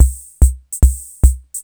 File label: TR-66 SWING; Crypton - DrumBase5000